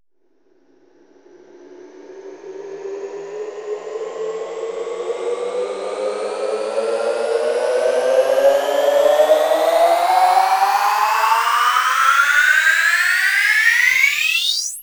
Sweeps Long

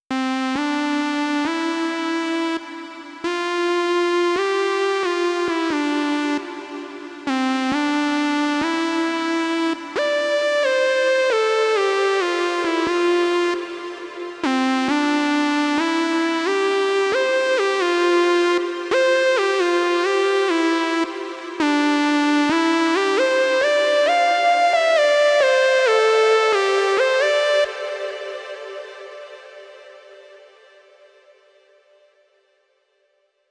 134BPM Mainlead Intro WET, Cmajor; Vengeance Samples; Lovely Day Kit

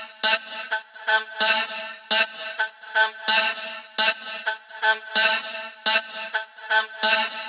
House Loops, Vocal Loops House 128BPM

